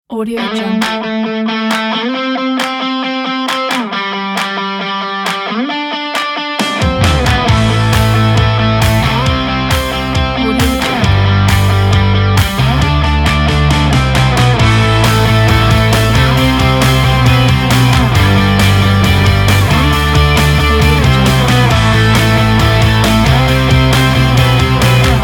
positive uplifting pop rock pack

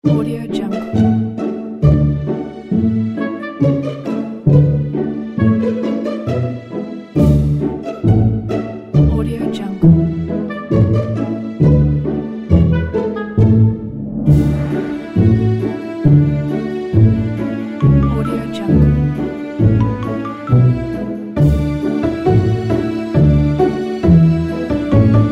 feel good spring mood

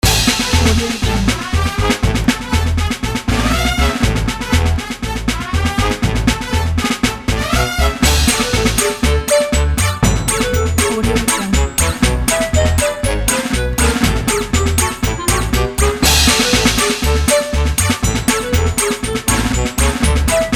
circus

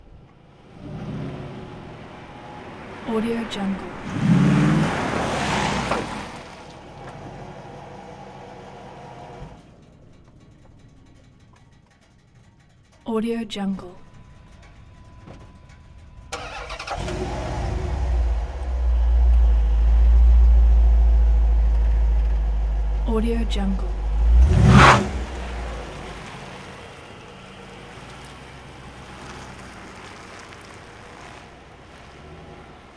2007 dodge charger in stop aggressive; prosoundfx

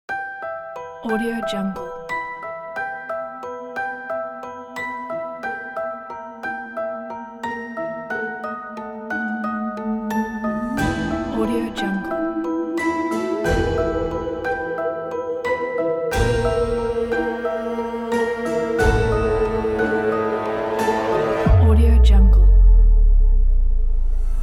halloween night

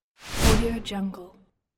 whoosh; 3dhome

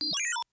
tone clusters mostly high pitch tones; prosoundfx

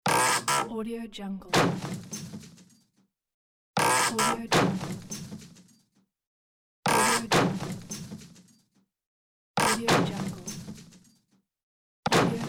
treasure chest

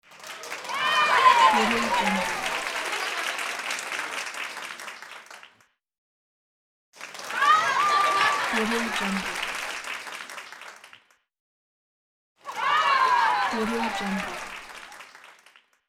shouts cheer

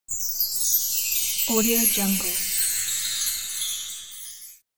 magic sfx

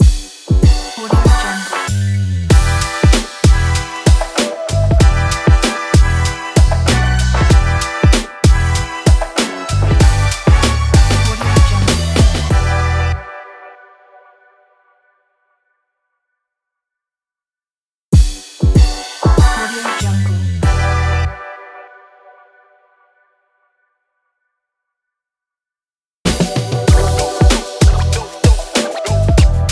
hip hop urban logo intro

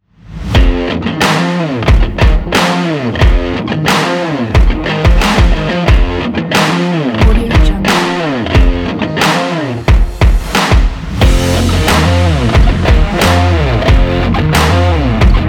indie rock; lp-music

